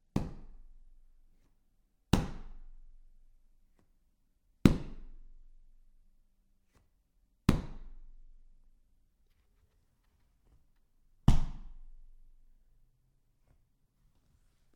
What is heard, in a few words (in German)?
sofa klopfen